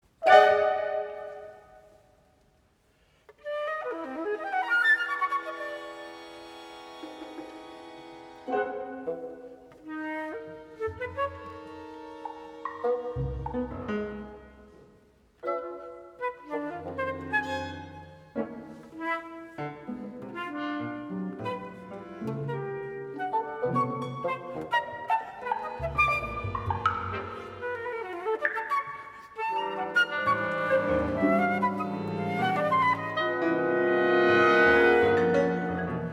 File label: flute concerto